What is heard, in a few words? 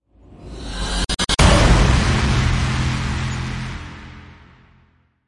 Access Point Rise Only; Ninja Tracks